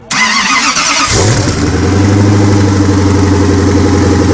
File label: Startup; Agera